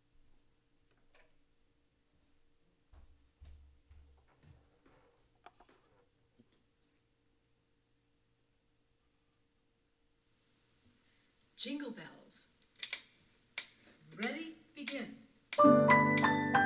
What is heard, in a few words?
Jingle Bells